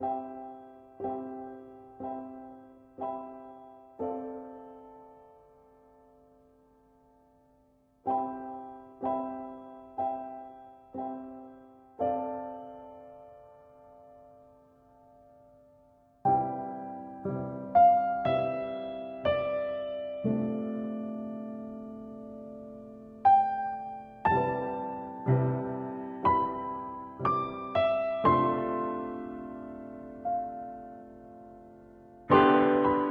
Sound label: piano tune; Minetest; VoxeLibre; mcl music